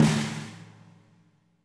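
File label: fat snare, warm ballad; Spectrasonics; Burning Grooves CD2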